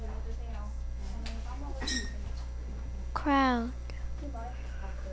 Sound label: crowd